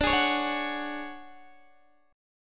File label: D Major 7th Flat 5th D down Guitar Open D